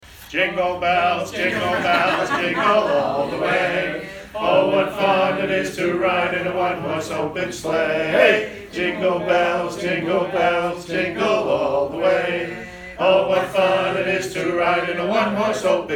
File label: Jingle Bells